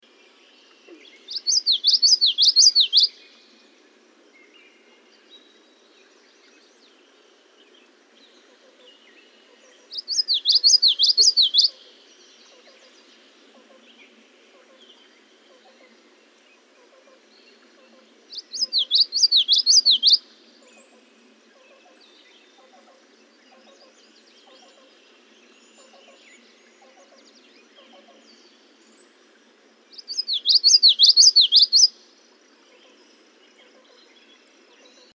Common Yellowthroat

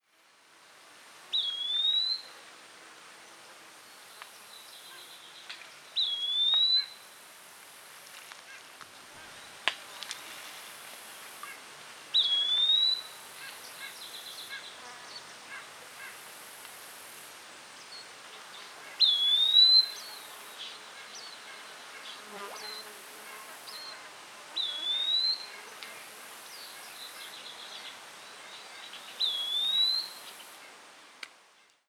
Eastern Wood-Pewee

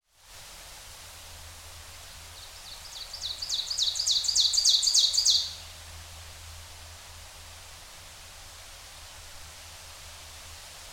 Ovenbird

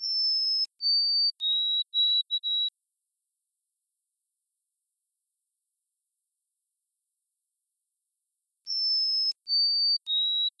White-Throated Sparrow

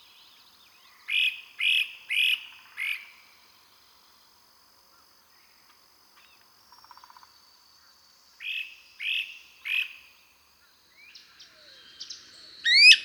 Great Crested Flycatcher

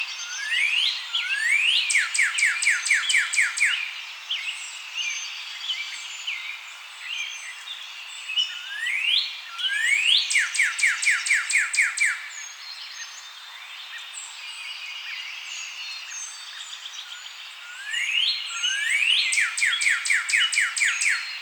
Northern Cardinal

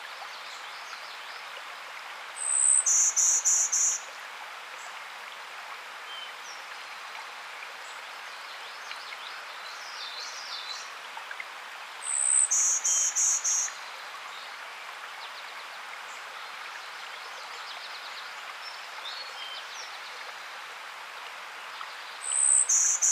Golden-Winged Warbler

